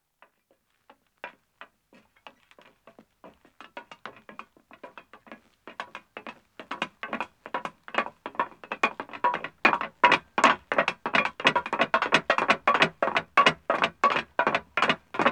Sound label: Metal Stairs Descending, 2 Men, Footsteps; BBC Sound Effects Library; Footsteps II